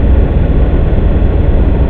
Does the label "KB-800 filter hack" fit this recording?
No